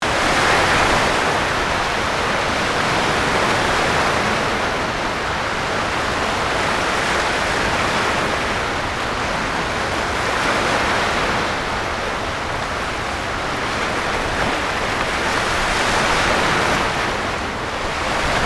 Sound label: Sea